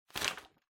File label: Flyer Rustle